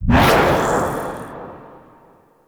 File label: Stalk Deactivate; Warframe; Ranger